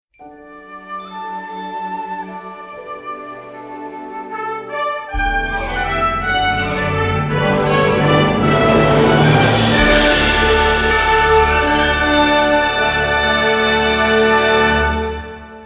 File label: Fanfare